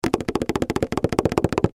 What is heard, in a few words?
Feet Scamper, Cartoon